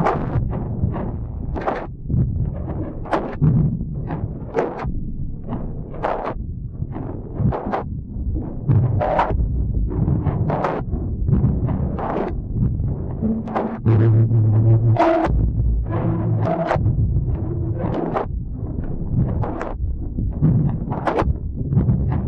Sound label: exhausted Loop; casualtiesunknown